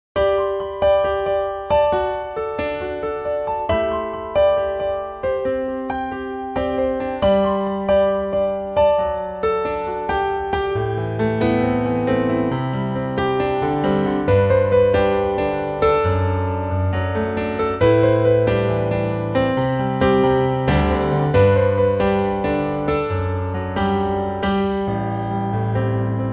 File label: piano G